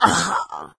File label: death, infected female; Left 4 Dead 2; Source Engine